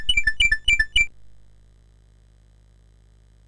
sid, MOS6581R4; Classic Concentration

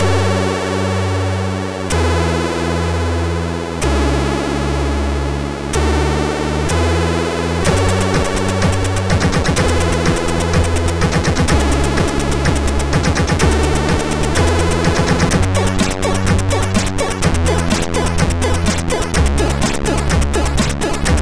Best Intro, MOS6581R2

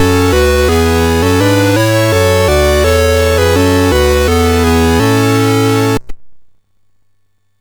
sid MOS6581R4; Passing Shot

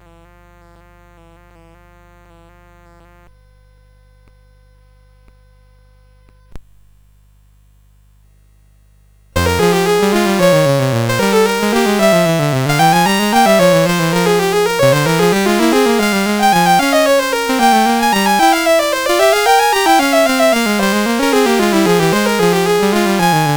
Two Part Invention, CSG8580; CSG8580R5

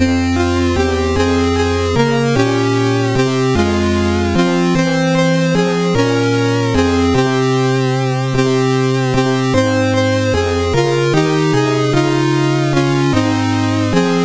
Short Loop; Fredrik

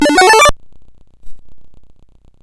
sid CSG8580R5

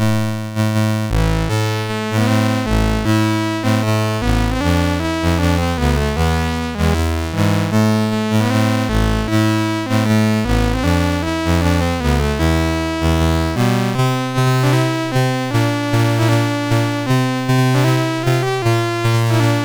sid MOS6581R2

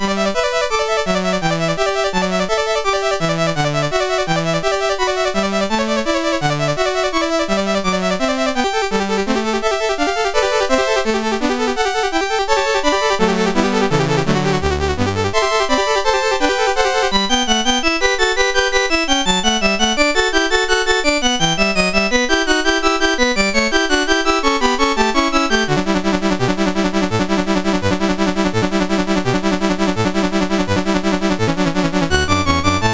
Variation, MOS6581R4; Goldberg Variations

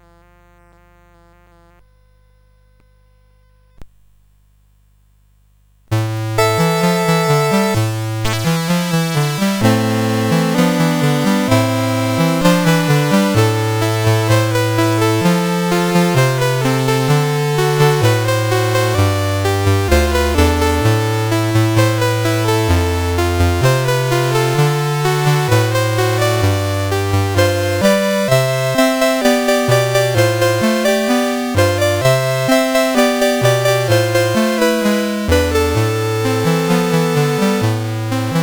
CSG8580; CSG8580R5

